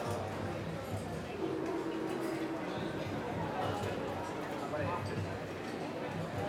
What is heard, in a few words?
lively restaurant